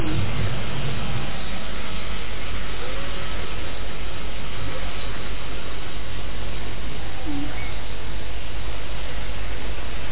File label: boat noise with whale, antigua